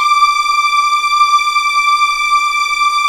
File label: D6 LEG F, VIOLINS; East West; The Ultimate String Collection